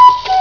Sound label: cuckoo; ANIMALS